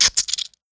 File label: blood